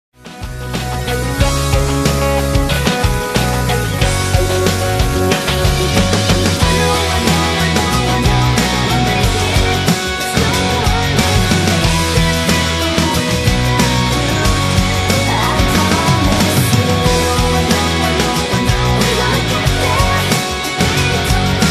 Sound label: karaoke; disney